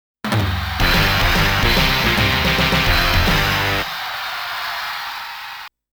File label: WINNER (Victory Demo); King of Fighters 97